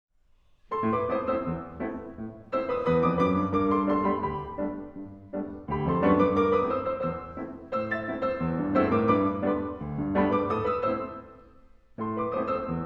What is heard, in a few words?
Piano Duet, Allegro; TAKLE